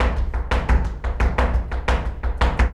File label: Flamenco, ZAPATEADOSOL